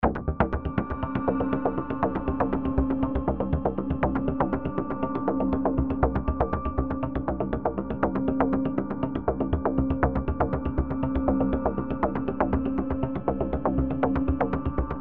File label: Eerie Musical